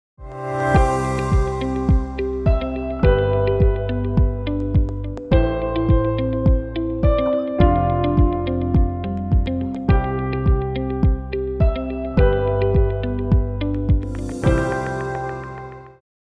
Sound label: relaxed inspiration